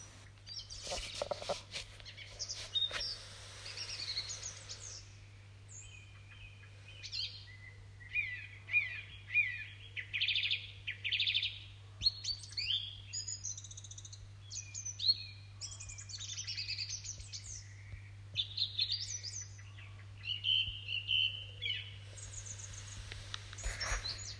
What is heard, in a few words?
Bird song Granary